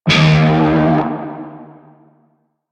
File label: Shawzin Flourish Oct One D Sharp; Music; Warframe; Narmer Shawzin